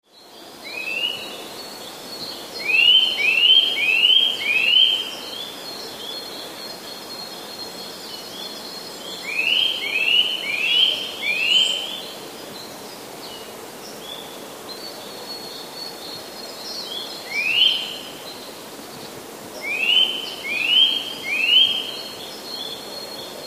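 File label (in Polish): kowalik marcowe nawoływania, nawoływania kowalika, które są wydłużonymi gwizdami, mocno zawiniętymi w górę; w marcowym, jeszcze stosunkowo cichym lesie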